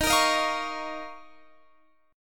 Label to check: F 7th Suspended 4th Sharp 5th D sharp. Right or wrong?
Right